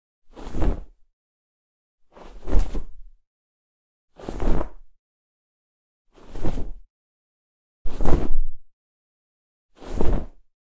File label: cape flap; superhero